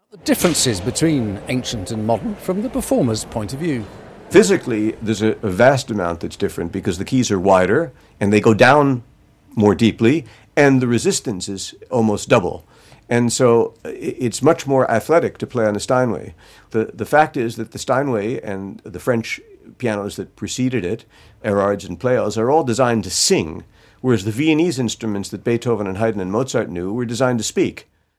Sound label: quintet for fortepiano and wind instruments rondo; wolfgang amadeus mozart